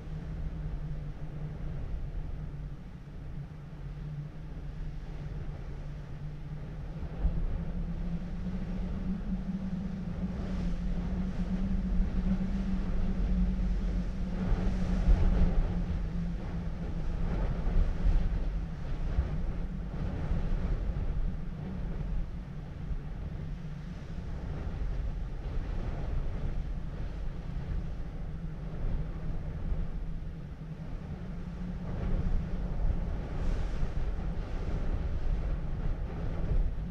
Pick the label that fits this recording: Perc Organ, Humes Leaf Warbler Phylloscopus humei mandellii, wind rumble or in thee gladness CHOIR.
wind rumble